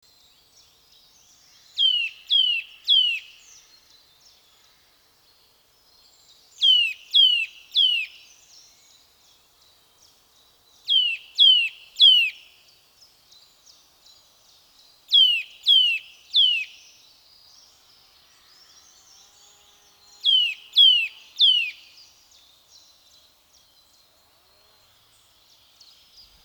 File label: Sitta europaea; SETE